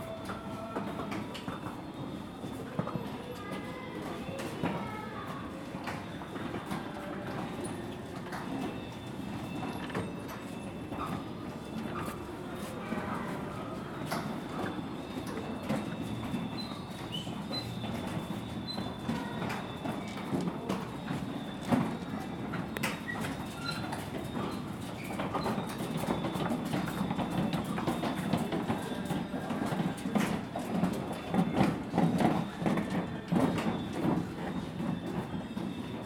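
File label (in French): Les rouleaux de prière temple salle du grand bouddha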